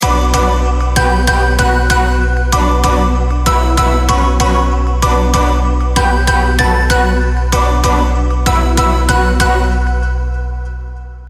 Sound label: Hip-Hop Trap Bounce, piano